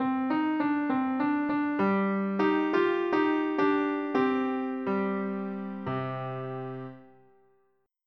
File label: RITARD